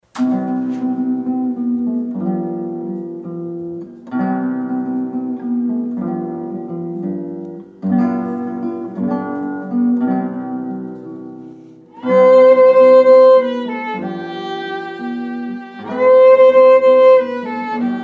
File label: guitar; Guitar & Violin